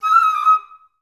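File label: FL ORMI D#5, Flute; Best Service; Peter Siedlaczek's Advanced Orchestra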